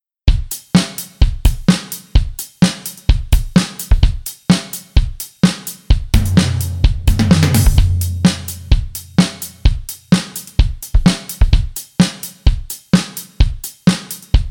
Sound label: Drum Track